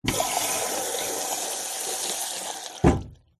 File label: public places public toilet basin sink faucet press down water flow off